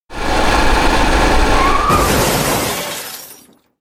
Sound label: bike impact; anemoi